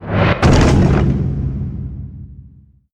Shield Crash without buff

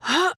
Grunt Jump

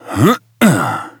grunt jump

